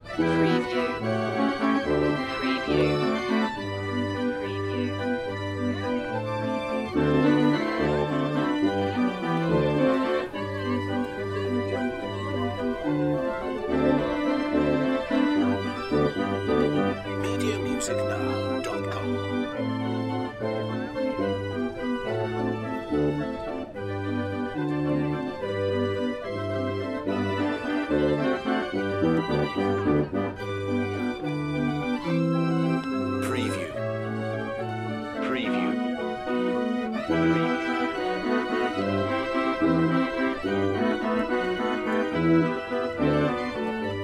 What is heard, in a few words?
Carousel Music Fair Organ